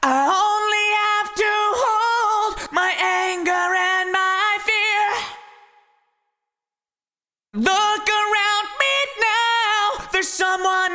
Vocal Eventide H8000 Masterverb Hall 1 Vintage Digital